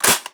bolt forward; Rust

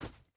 footstep boots snow run; Rust

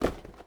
drop rubber; Rust

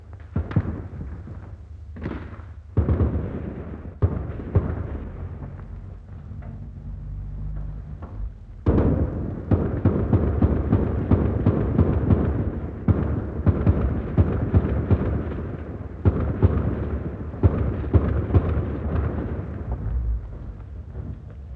Amb Destruction; Red Orchestra Ostfront 41-45

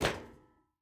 footstep barefoot metal jump land; Rust

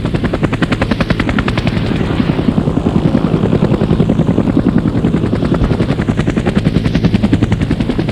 helicopter military loop; Call of Duty Modern Warfare

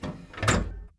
Vehicle Hatches; Red Orchestra 41-45

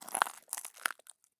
walk glass; Escape from Tarkov

